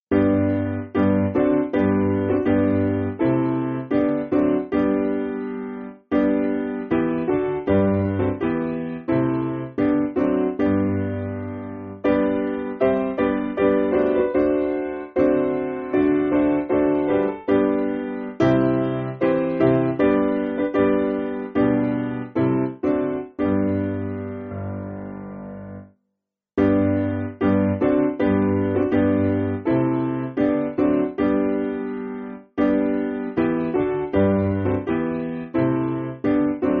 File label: Piano